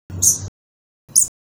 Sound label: wheep, degu